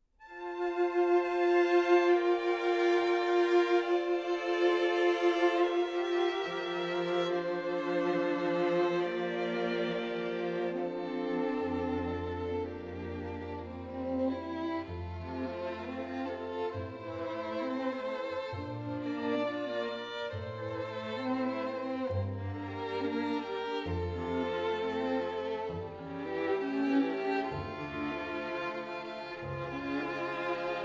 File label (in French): quatuor